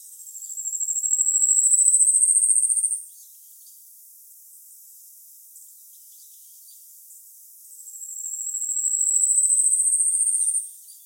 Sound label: Urosphena squameiceps